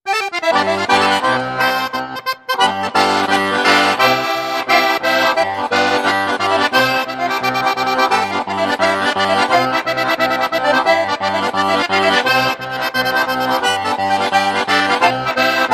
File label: Tarantella Pizzicata No Percussion